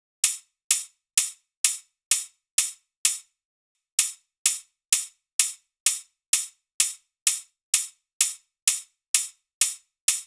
loop hat 128; Seismic Loops

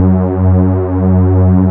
PAD WARM, Nord

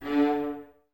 String Ensembles, Violas, VLS Detache

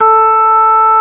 ORG. A4; B3 FULL

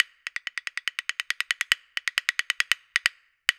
PERCS 140BPM; INIS; Opium

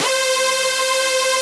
C Synth, One Shots; Electronisounds; Vibration Expedition

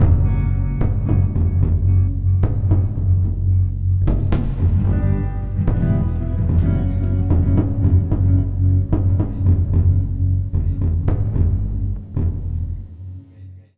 Full Mix, F#; Zero-G Kocktail Kollection; Construction Kitz (Lounge); Volcano at Sunrise 074

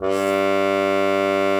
CUP MUTE; Xsample VOL-3

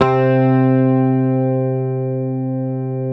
Hamer 12, SUS, C3, Bass; Sampleheads; Will Lee Bass Library

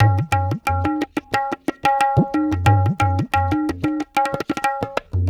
Tabla; Sonic Foundry (Sony Creative Software); Ambient Grooves

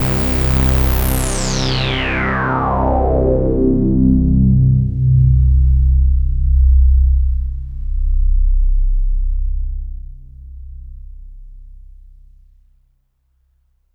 SWEEPY, Synths

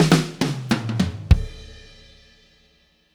Disco 100, Fills; Zero-G; Monster Beats

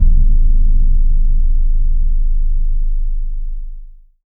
BIG BD, DRUMS; Spectrasonics; Heart of Africa

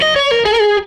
Strat Guitar Loop

